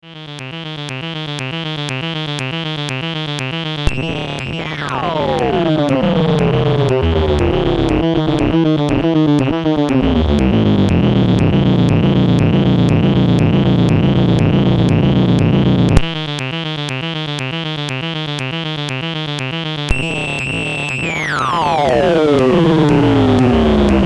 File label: synth